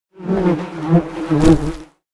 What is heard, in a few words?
bees; Fate The Cursed King; SPELLS